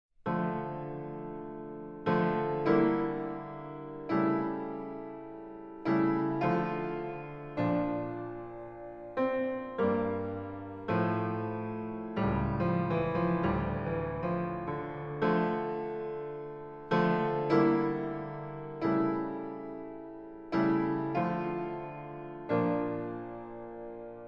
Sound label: chaconne in d minor